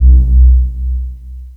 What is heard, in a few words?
Synther B, Basses; Total Drum&Bass